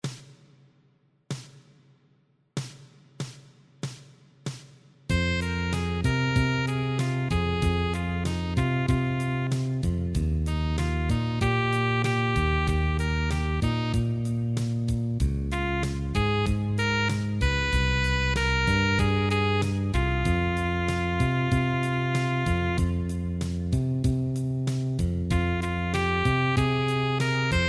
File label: play along piano